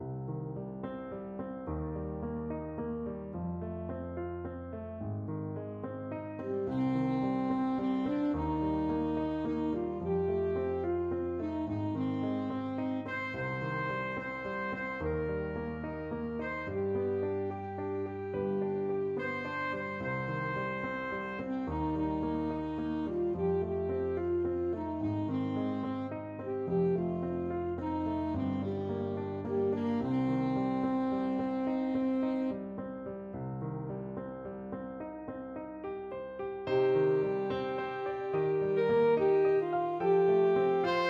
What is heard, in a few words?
saxophone